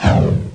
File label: firebal; Total Chaos Nukem 3d Pack; duke